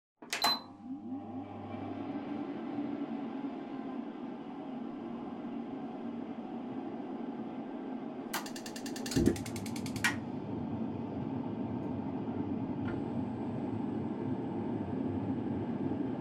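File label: Kerosene Fan Heater Operating Noise Far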